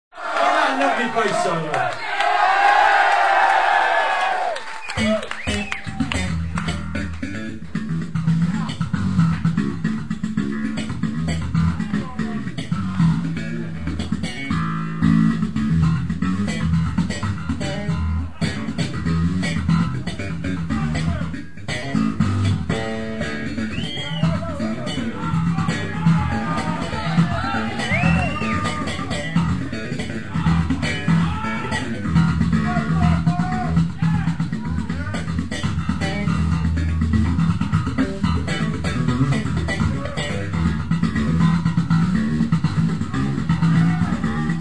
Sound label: Bass Solo